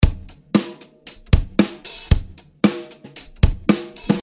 Comp Electro; Rcomp

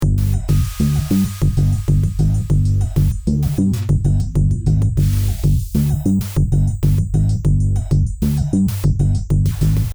Bass

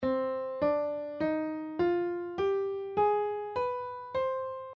c hm scale

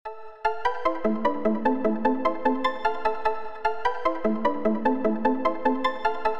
Melody Sauce

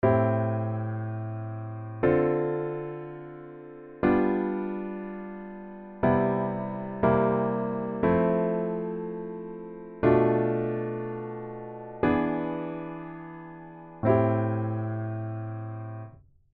fly chord

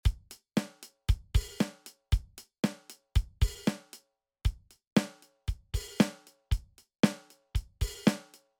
Drum; Magenta

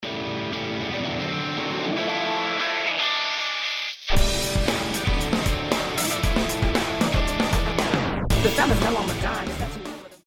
filter

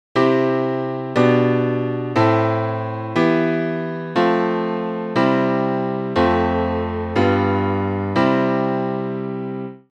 orchestration

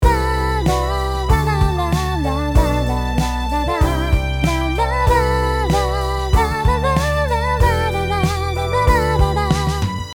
Counter Chord

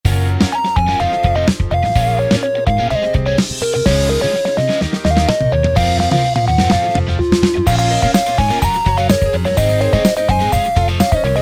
Drums Snare Roll

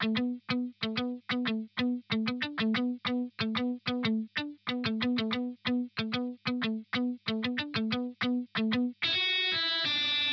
EG Single Real Strat

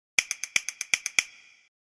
scale

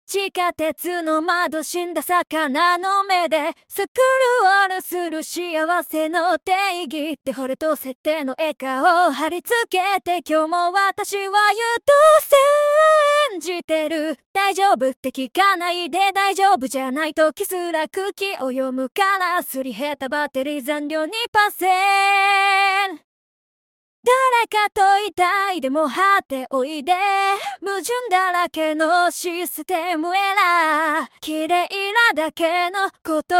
Acapella

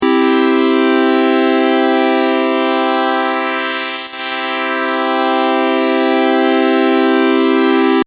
pulse width modulation

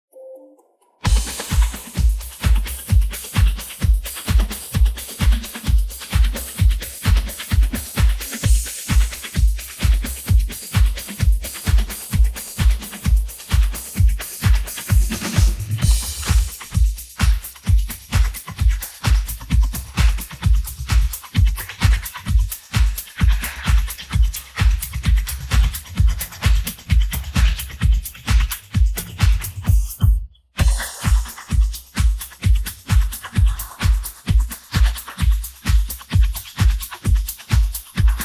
drum; RX10

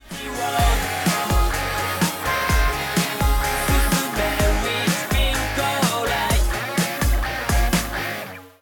Tape After

